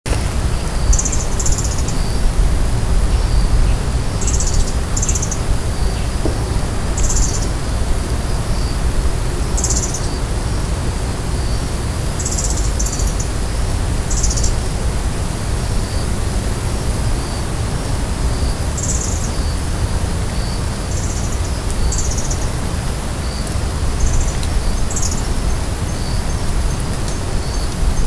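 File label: kosamebitaki, Asian brown flycatcher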